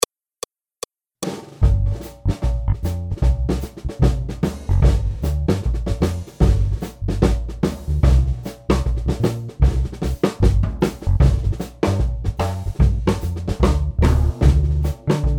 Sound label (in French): Accompagnement seul